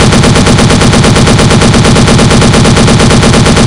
lmg m249 shoot; tf2classic

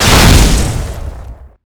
rocket explode, weapons; blackbox; tf2classic

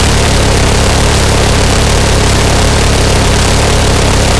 shoot; graphite; tf2classic

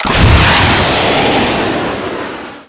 rocket pack boosters quick; tf2classic

